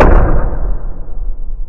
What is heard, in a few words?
explosion